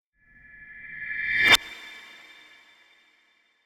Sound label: Upright Piano Transition; ATMOSPHERIC ELEMENTS; MatiasMacSD; PIANO ZONE